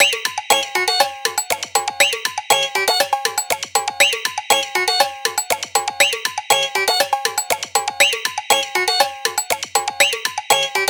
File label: Bright Beat; PlusFourSix; Rika (W705); Lars Edvall